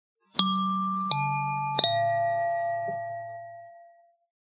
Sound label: sms cymbals; Mudita Pure